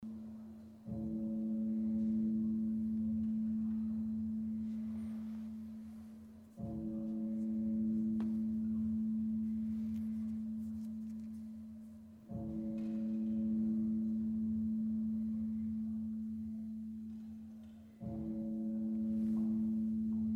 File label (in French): Gong